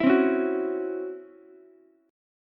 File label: C sharp Minor Major 7th Double Flat 5th Ukulele; C#mM7bb5; strummed; JGuitar